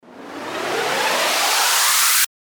RISER

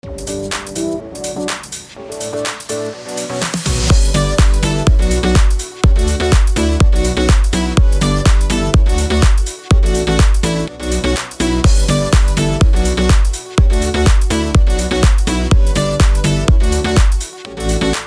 BED ELECTRO HOUSE GMIN 124BPM

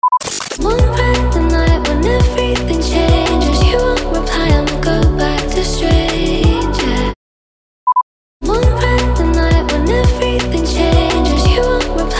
170BPM Em

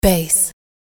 bass

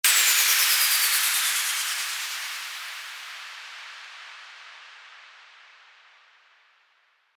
WHOOSH

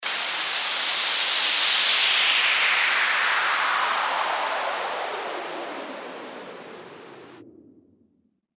WHOOSH

